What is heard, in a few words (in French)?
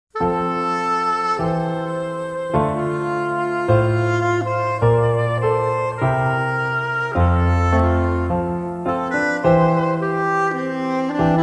sonates pour violon